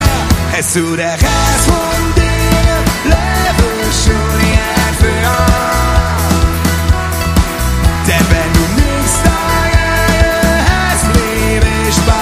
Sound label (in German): live mit orchester